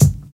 silky bass drum single hit d key, bass drum single hit tuned to the D note